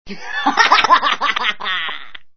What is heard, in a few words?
laugh